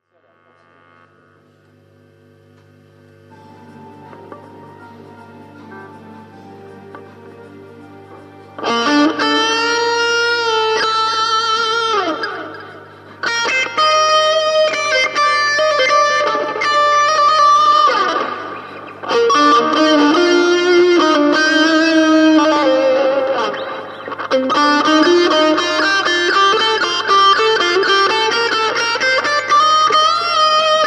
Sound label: Guit